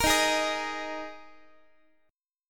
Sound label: Eb Suspended 4th, Guitar; strummed; x,x,13,13,12,14; 4A,4E,5A,5D,5G#,6C#